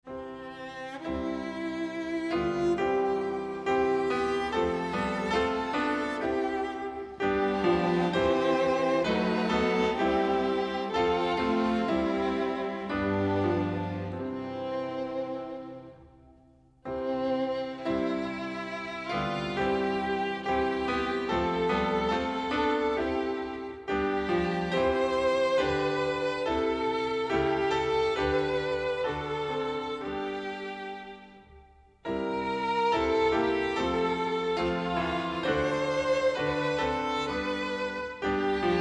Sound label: instrumental, hymns; with all the power of heart and tongue